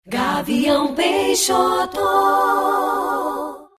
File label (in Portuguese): vinheta acapella